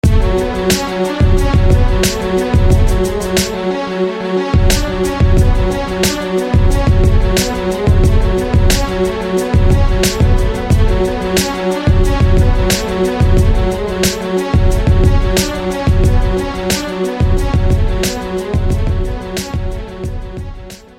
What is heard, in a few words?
Brief Strings